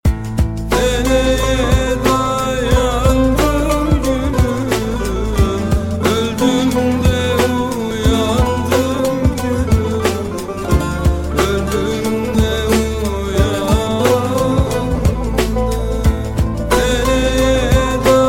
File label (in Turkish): melodisi zil sesi